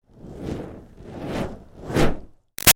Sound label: Fire Torch Whoosh Slow Moves; Lite Tails; Flames